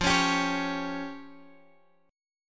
F Suspended 2nd Sharp 5th F down Guitar Open G

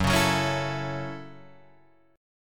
F sharp Minor 7th F sharp, Guitar Open D

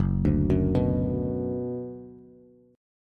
Gb Suspended 4th Sharp 5th, Bass; arpeggiated; JGuitar

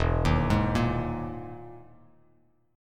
E-Major Sharp 11th E, Bass Standard; EM#11 arpeggiated; 0,6,6,3; JGuitar

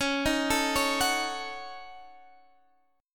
D sharp Minor 7th Flat 5th C sharp

